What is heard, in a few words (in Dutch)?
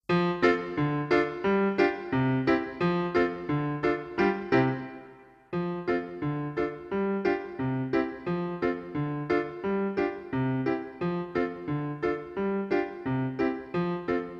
pianobegeleiding